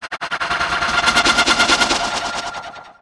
weird; Best Service - XXL Production Effects

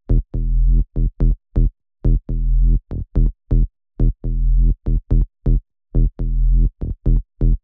123BPM SUB Emin, Bass Loops

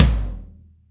TOM, Drums; Best Service; Real Mega Drums VOL-1

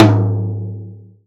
J T 3 FFF, TOM JAZ

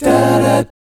BBMI7, GUY CHORDS, Vocal, Jazz; ILIO; Vocal Planet VOL-3; Jazz & FX

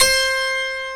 HARPSICHORD

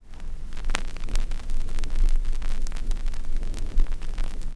X CRCK L, RECORD-FX; XP RECORD-FX; Best Service; XXL Production Effects

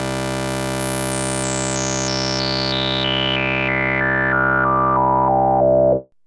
BASS SWEEP; RHYTHM; AMG - Vince Clarke Lucky Bastard

